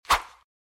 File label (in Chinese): 刷